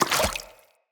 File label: Root Spider Mother Step Water